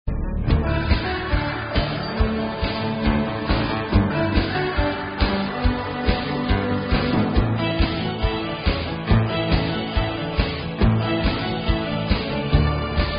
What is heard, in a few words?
UFO Polka